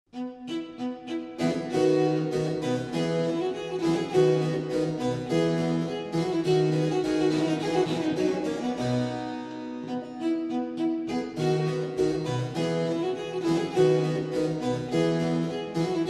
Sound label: pipa